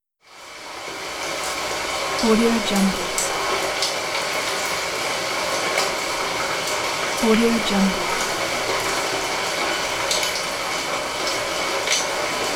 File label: rain on metal; interface sounds